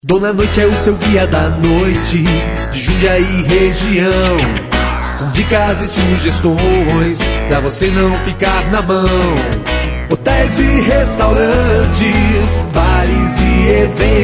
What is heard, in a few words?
JINGLE; DONA NOITE